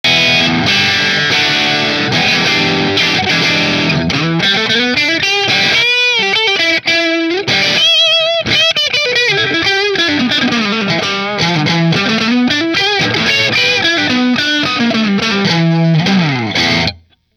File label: Mcnaught dark blue middle marshall; McNaught Custom Guitars Vintage Single Cut Dark Blue